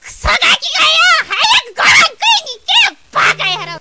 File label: kid